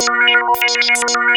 random VCF; E-MU Formula 4000 Series Vol. 1; Hip Hop Nation

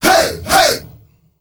"hey", world voices, afro; ILIO; Vocal Planet VOL-5; AFRO NOISE

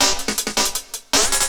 LOOP, 160 BPM, Drum & Bass

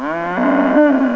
COW; CMI Sound Library Rev 1.3

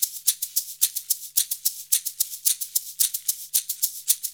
110 MIX, Percussion Loops

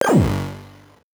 VocoBit Noise, 8-bit; musicradar; 8-bit bonanza

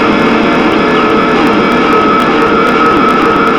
FX GTR, GUITARS KIT; Big Beat Samples & More; AKAI

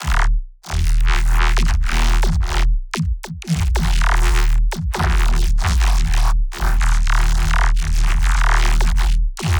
granular neuro, bass

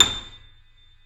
YC7 piano, pp; Roland L-CD701; pp YC7 St

